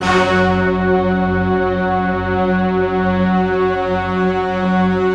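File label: F#3, STACCATO ORCHESTRA; Best Service; Advanced Media Trax Modern Composer; STACCORCH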